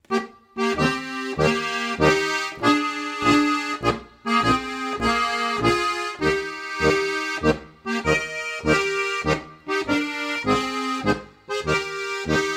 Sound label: Happy birthday, harmonika